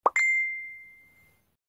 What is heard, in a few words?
receive